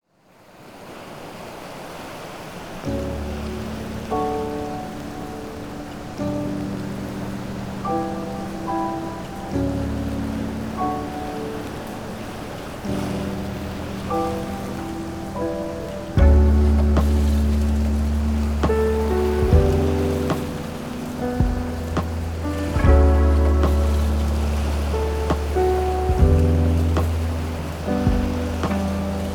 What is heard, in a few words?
Relaxing Time